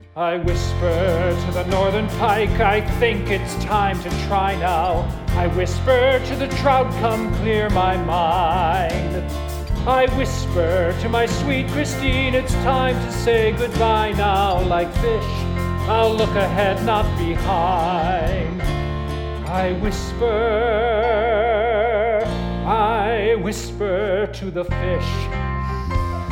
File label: Whisper